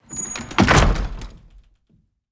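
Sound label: wood single light close, door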